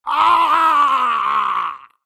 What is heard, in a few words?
grunt electro; Titanfall 2